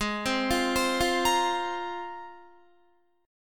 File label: Ab 6th Add 9th, Guitar; arpeggiated